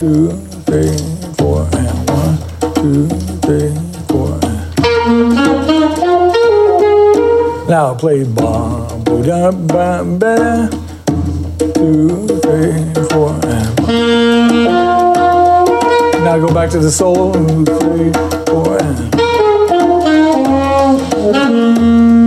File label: 16ths with 3 over 4; jazz; Mike Longo; rhythmic nature of jazz